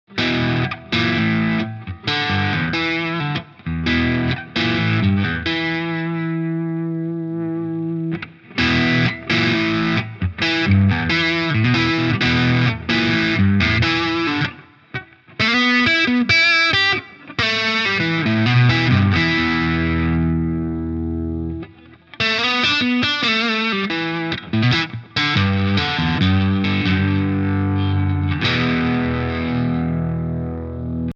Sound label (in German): Reverend Trickshot verzerrt Hals Rhythm